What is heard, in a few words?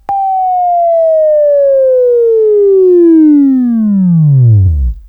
Buzz; Labview-Program; Sweeping Training program